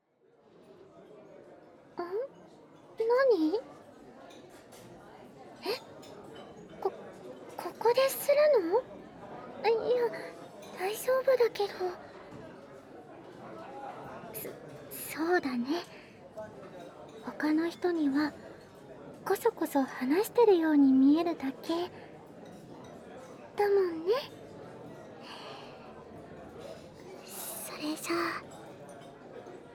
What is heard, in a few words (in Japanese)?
左耳フェラ／ねっとり／ファミレス; フェラ素材